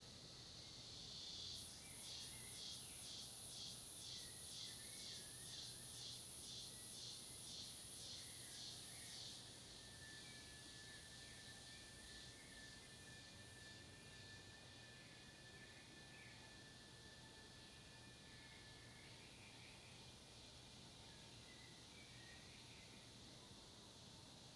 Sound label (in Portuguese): Ambiencia cigarras na mata gravacao dentro de quarto de uma chacara